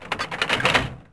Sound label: trap trigger; Severance